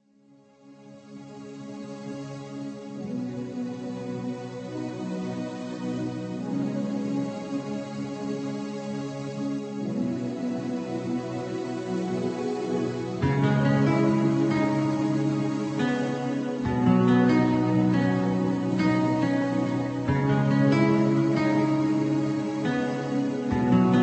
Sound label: instrumental love beats